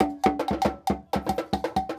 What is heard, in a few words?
120 wood